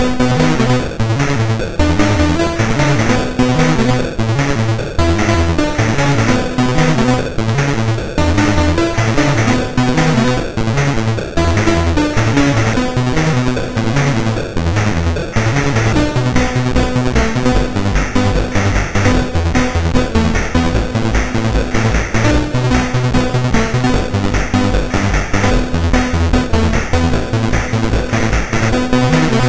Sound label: Synth Sample III, MOS6581R2